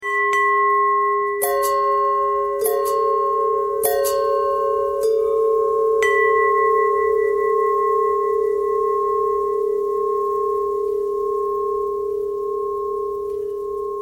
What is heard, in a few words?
long bell bell bend threads